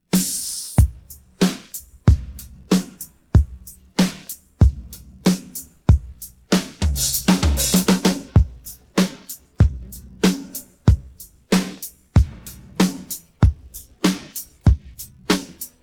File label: pattern, drums